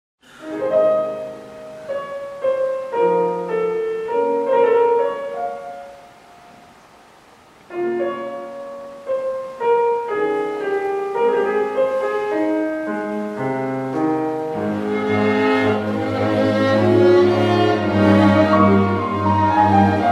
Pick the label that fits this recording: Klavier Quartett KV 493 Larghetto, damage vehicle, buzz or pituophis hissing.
Klavier Quartett KV 493 Larghetto